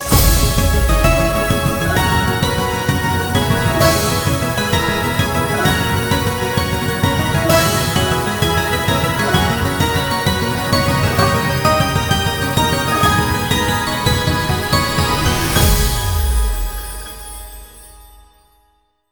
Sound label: super mega win; turn your fortune